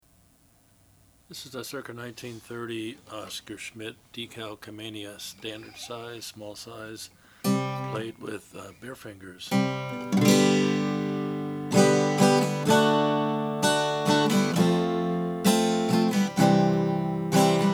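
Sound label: oscar schmidt decalcomania oxblood, Guitars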